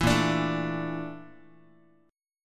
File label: A sharp Major 7th Flat 5th A sharp Guitar